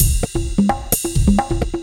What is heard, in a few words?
Beat, Drums & Percussion; Electrocution; Beats